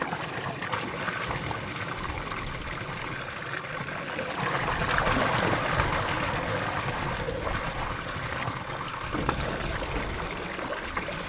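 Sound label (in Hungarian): vödörből vályúba víz beleöntése, kifolyó víz, gémeskút, hortobágyi puszta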